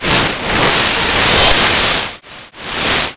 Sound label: DISTO; USB Soundscan vol.33; AKAI; Disjointed Textures